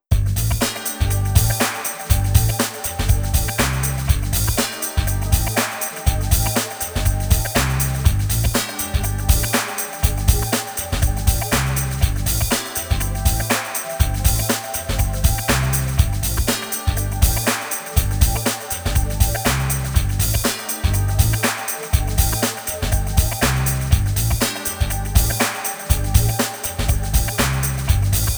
License Fail ⁄ Qualify-Race Menu ⁄ Post Race (2nd-6th); Gran Turismo Japanese Edition